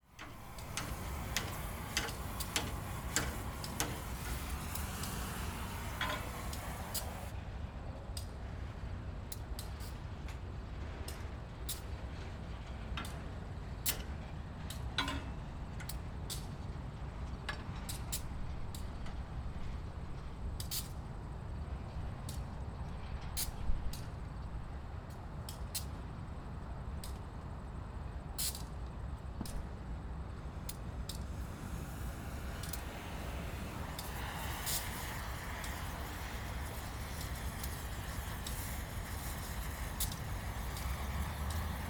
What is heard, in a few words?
Ambience Train Yard Near Road Worker Weld Train; AdobeCC